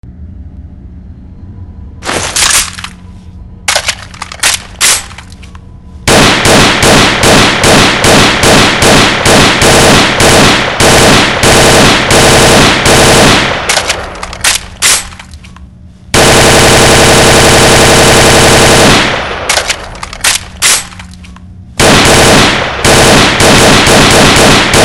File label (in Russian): zakladka lenty i strelba